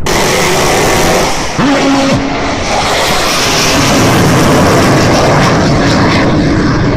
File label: f 16 strafe